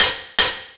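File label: snare, EMU Orbit-3 drum machine; 300 Drum Machines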